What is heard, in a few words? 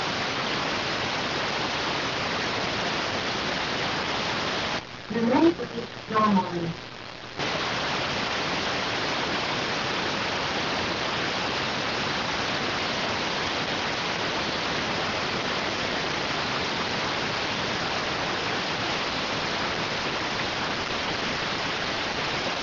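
radioteletype receive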